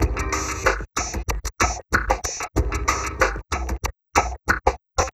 Orbish Funk, Synchro Funk, Beats; Sonic Foundry (Sony Creative Software)

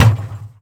WATER KICK, percussive

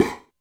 Puh Tooy, Drum Machines Alesis D4

